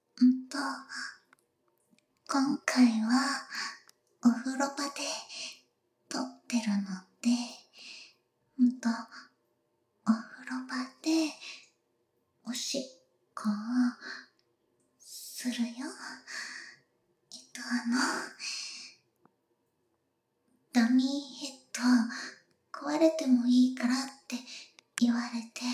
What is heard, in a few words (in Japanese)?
ダミヘにおしっこ, ASMR